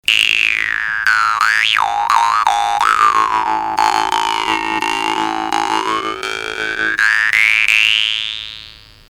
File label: doromb